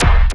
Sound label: Zappy Kick